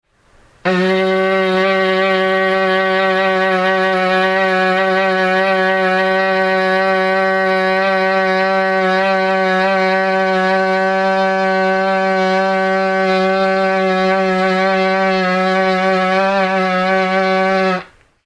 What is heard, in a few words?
vuvu